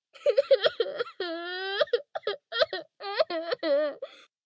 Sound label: Crying Sobs, Crying, 1 girl, short, sobbing, human voice, kid, child, female